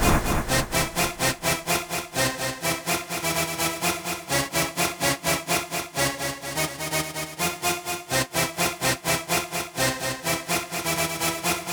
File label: rave sequence - Fm - 126, hardcore; spirit